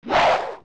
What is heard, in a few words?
Swipe; Volvagia